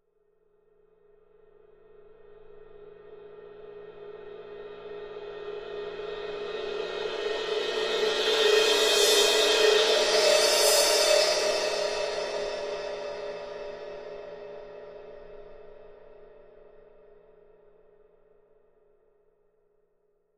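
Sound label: Cymbal Large Crescendo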